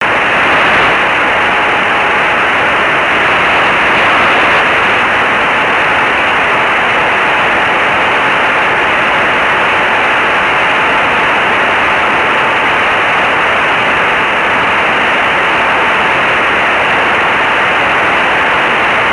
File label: received with paper clip; kaguya; The S-band downlink of Kaguya at 2363.6 MHz was received by Michael OH2AUE using only a bent paper clip as the antenna.